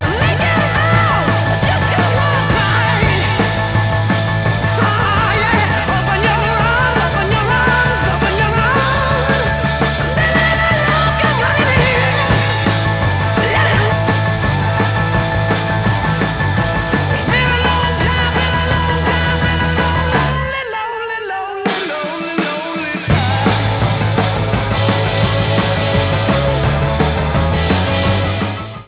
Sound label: Rocknroll